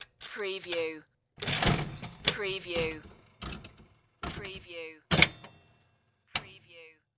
Slot Machine Insert Coin Handle Pull And Wheels

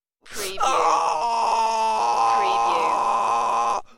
Man In Pain

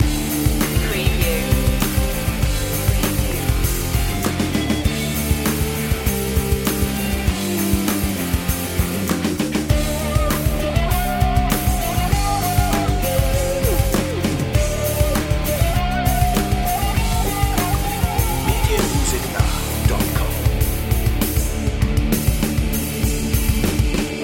hard like a rock loop

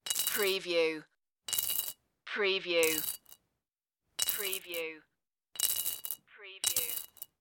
fill pottery bowl

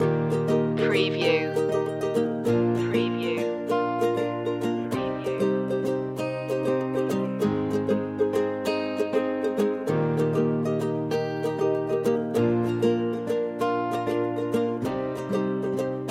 joyful day loop

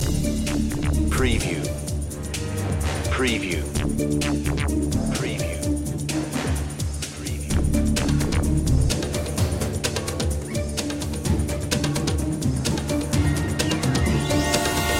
digital voodoo loop

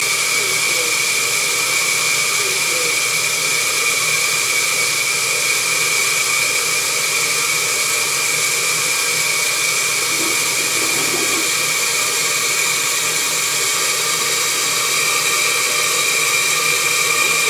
Pipe Noise

